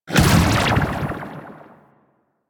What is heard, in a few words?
Staff Jab Hit; Warframe; Monkey King